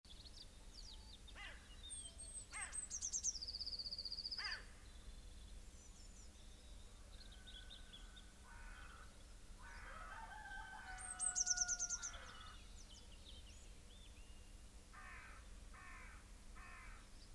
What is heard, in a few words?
Cyanistes caeruleus; SETE